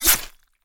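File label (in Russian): легкий лязг двух ножей друг об друга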